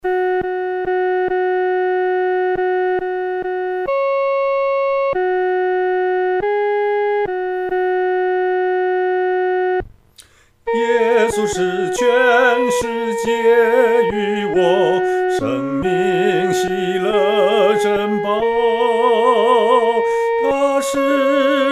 独唱 第一声

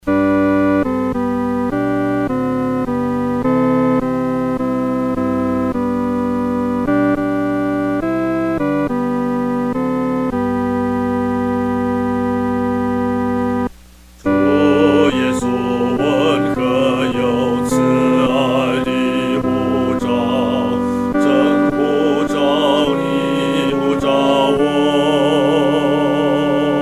独唱 第二声

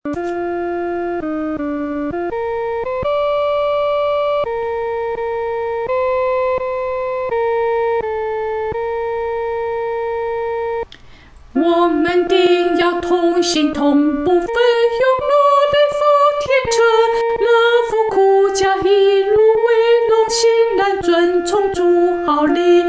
独唱 第一声

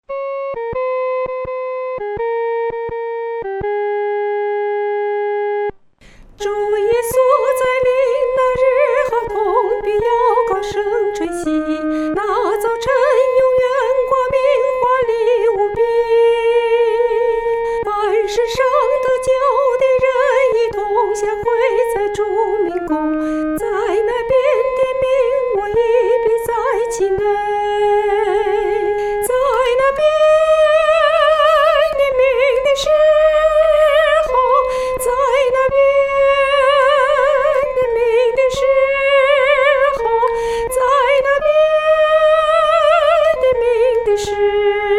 独唱 第一声

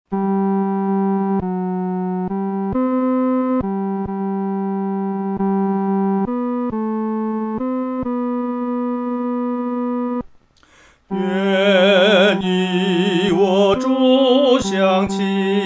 独唱 第三声; 与主相亲

